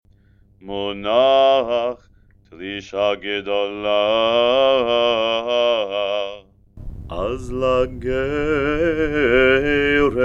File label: haftarah, trope